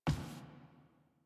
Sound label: Apartment Foley; Warframe; Zariman; HubApartment